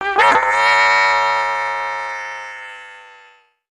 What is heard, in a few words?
Howl, creatures pets